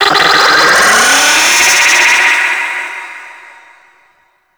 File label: Space Drone Attack; Warframe; Grineer